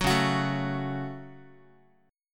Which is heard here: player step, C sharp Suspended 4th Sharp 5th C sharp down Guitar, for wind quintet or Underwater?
C sharp Suspended 4th Sharp 5th C sharp down Guitar